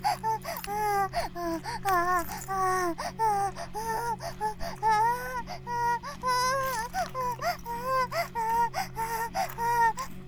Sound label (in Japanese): バイブ放置, ASMR